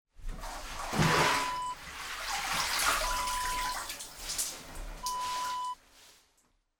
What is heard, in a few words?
sauna whisk wet